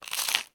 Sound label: human eat crunch rye crispbread; sound effects one